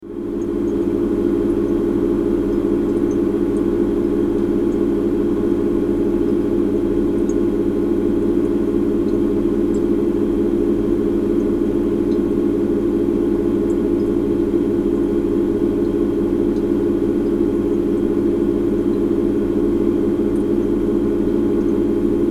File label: droning odor at glockengasse; koeln